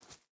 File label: footstep boots grass jump start; Rust